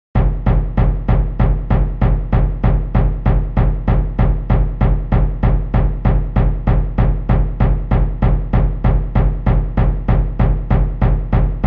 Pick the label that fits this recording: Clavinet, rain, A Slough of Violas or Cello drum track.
Cello drum track